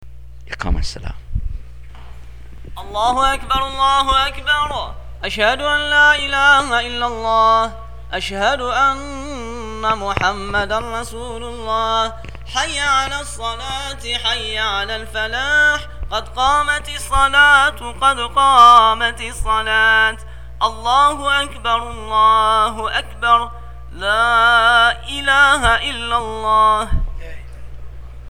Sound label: Iqamah